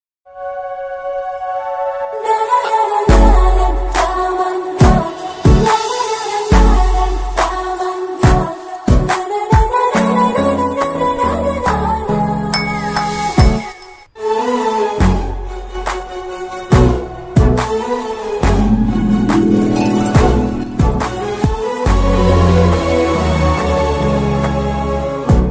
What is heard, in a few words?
love bgm; ntr